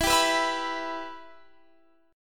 E Minor E down

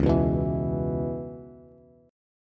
Db Minor 7th Db down Bass, Dbm7 chord; strummed; JGuitar

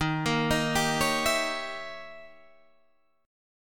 Eb 7th Flat 9th Eb, Guitar Open D; down

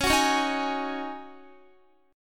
Db Suspended 2nd Suspended 4th Db Guitar; strummed

